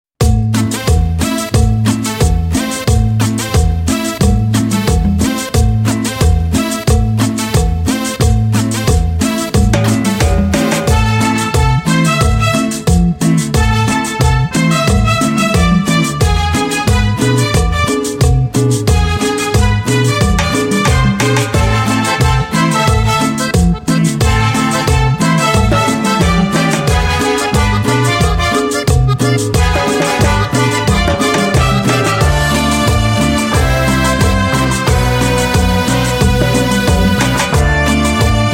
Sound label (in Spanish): Cumbia Clasica